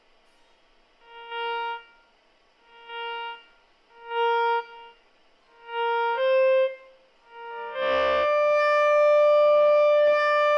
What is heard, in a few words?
Bb drone gtr